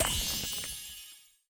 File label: Animations Repair Small Old